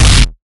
Hellmouthsnare

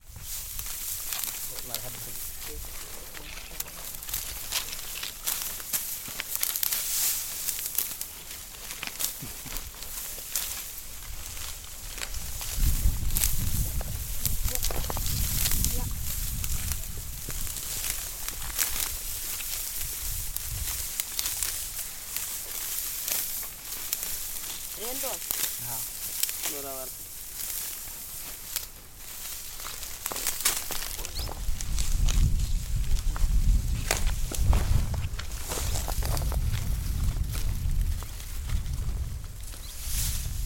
Manual Wheat Harvest

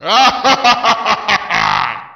Evil Laugh Demon

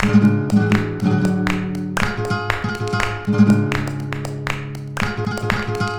Flamenco Loop 120bpm

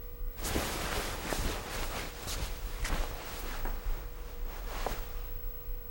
Polyester Rustle

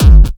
Kick Techno

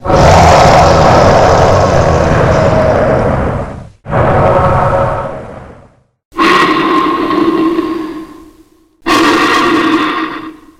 Dinosaur Roars

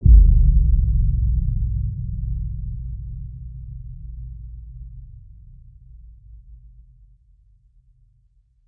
Roller Blind Impact

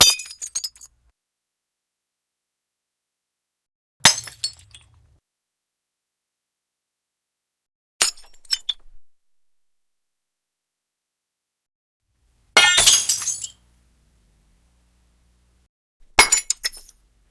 Glass Break Smash Shatte Crash

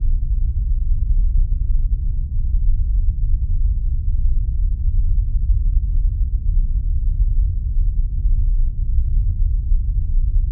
Low Rumble Loopable Ambiance

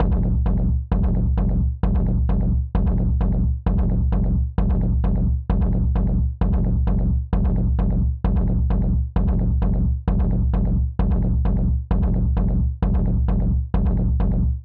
Kick

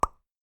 Like Thumbs Up

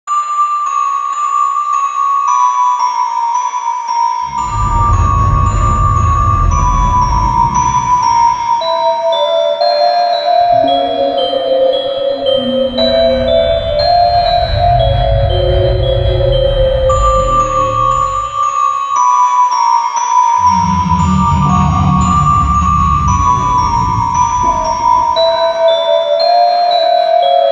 Scary Haunting Melody

